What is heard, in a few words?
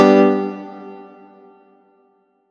soft hit whistle